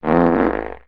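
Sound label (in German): Furz Pups Geräusche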